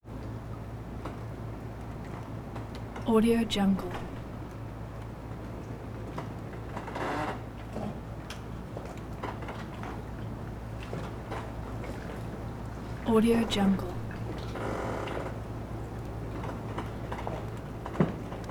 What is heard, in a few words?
marina ambience